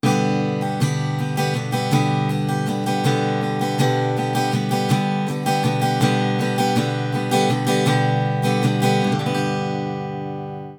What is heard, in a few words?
Ac Gtr Spread